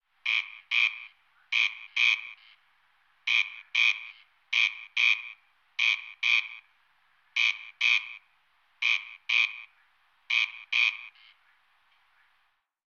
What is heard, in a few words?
ambient cricket; STALKER